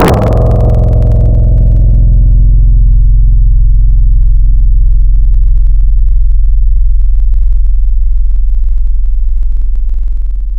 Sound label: Futuristic, Tropical Mallets; Synthetic's Serum Collection; SparkPackers